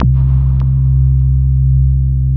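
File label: wall moog, bass; Spectrasonic Distorted Reality 1; WALL MOOG BS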